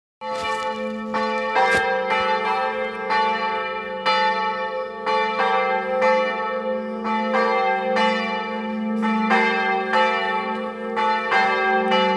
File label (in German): Glockenlaeuten Sonntagsruf